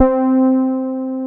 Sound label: Poly800 C3, Korg; Back In Time Records; Korg Universe VOL-1